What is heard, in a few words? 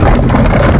cannon roll; Spanish; pirate room sfx